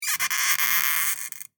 Squeak Wood, Cartoon; Cartoon Sound Design (Sound Effects:Cartoons); BlastWave

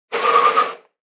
Car Or Motorcycle Brake, Tyre Screech

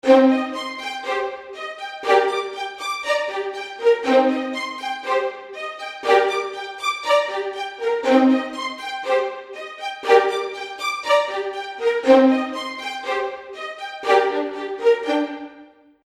Seamless Music Loop Strings; musical

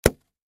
Party Popper Pull, Party Popper Pull String Pop; Party Horn Sound Effects; Games; Foley; Blastwave

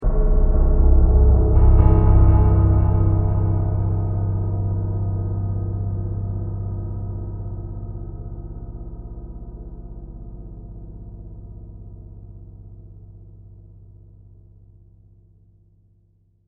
Dark lingering low piano note transition; Musical Sound Design

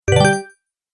Deep Sparkle Notify; Beep Sound Effects

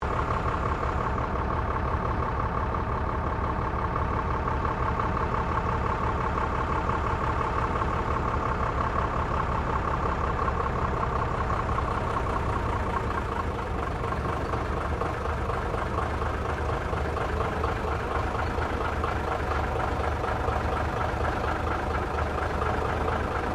Bus Double Decker Bristol VR Manourvering Slowly; Bus Double Decker Bristol Vr 1971; Bellarine Tourist railway vehicles

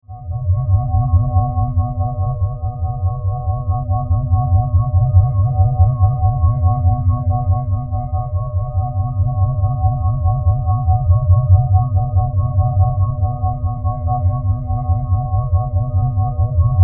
Science Fiction Alien Power Drone Modulation; Spacecrafts

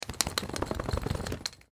Dog Shake Fur Collar Jingle; Animal; Blastwave

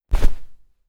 Cloth Character Movement Foley Whoosh

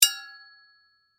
Sai Hit Impact Metal, Warfare; Sword Sound Effects; Swords (Sound Effects:Combat); BlastWave

